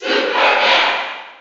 Cheer; Ness; SSB4

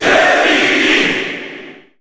Cheer Japanese; Terry; SSBU

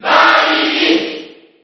Cheer JP; Larry Koopa; SSB4

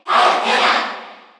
Cheer Korean; Palutena; SSBU

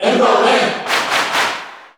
Cheer Korean; SSBU

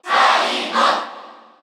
Cheer Korean; SSBU

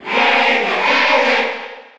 Cheer Spanish PAL; King Dedede; SSB4

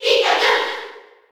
Cheer; Pit; SSB4

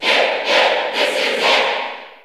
Cheer English; Pit; SSBU

